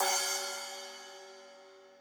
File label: crash sample c sharp key